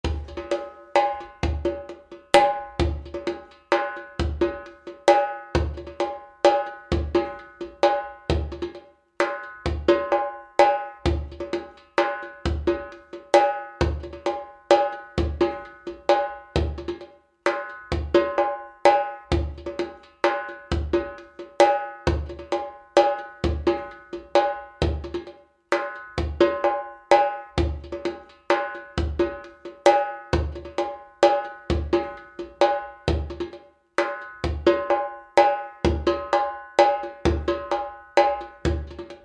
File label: Triplets Right; Contemporary Exam Level 5